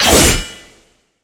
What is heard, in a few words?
attack vertical; red velvet; Cookie Run